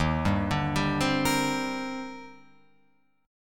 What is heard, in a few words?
D sharp Major 13th D sharp Guitar Open F, chord; arpeggiated; JGuitar